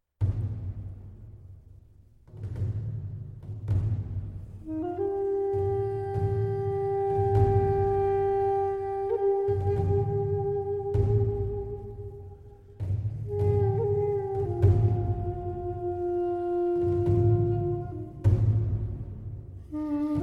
Funeral march (Drums)

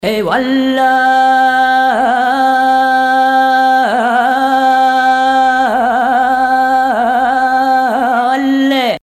Vocal (Allah)

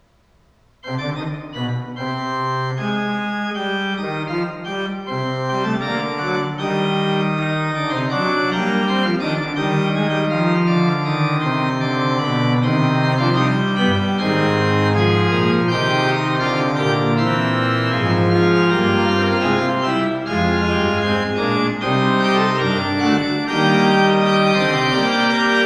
Fugue in c Minor, Organ; Johann Pachelbel; Complete Organ Works

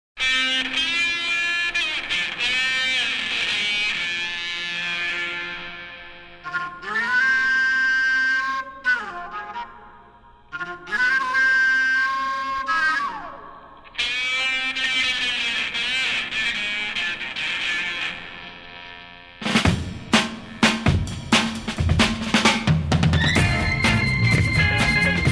Tube and break

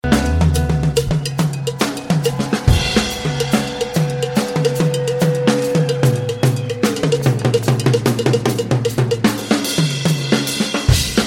Drums; Mix Picks Vol. 1

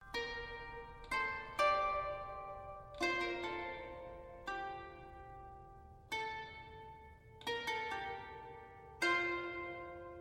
Postludium (Medieval Harp)

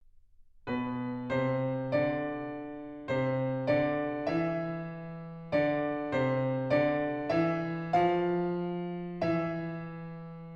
Unison Melody, Piano; Book 1; Mikrokosmos, Sz. 107; Bartók; Complete Solo Piano Works

